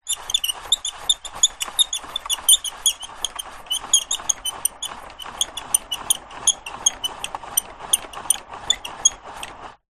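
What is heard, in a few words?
office paper shredder manual handle turn squeak no paper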